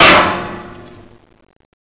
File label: metal, debris